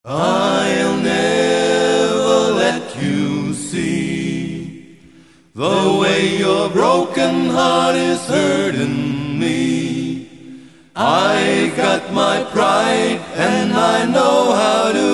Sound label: (a capella)